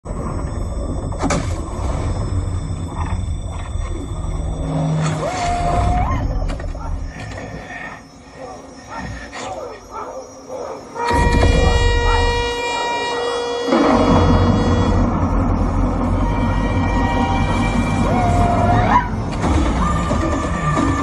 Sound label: movie bgm theme